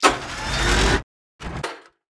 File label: WPN Rifle Laser Gatling Fire Spin Up